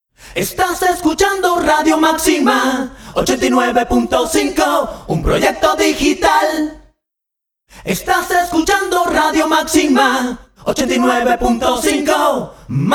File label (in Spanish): solo voces